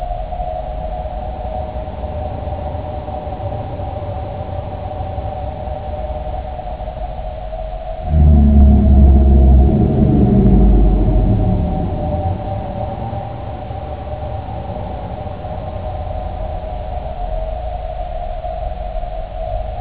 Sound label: Erie Sound; Halloween